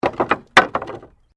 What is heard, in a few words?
Wood Movement Medium; Material components for constructing more complex sounds; Materials